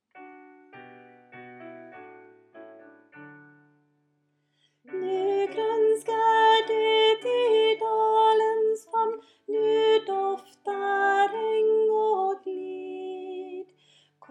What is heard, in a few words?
tenor; Nu gronskar det